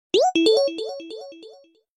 Notification Sound; iMessage

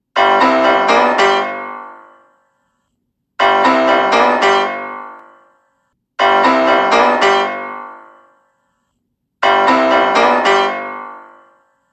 piano riff

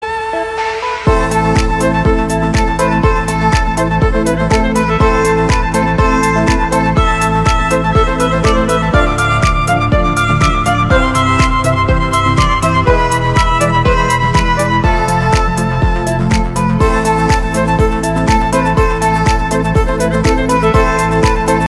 Violinist Ringtone; Bella Ciao

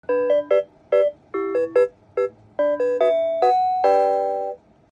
yealink ringtone

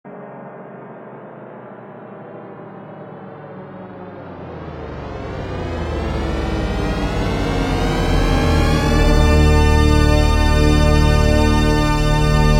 Ringtone; Thx Deep Note

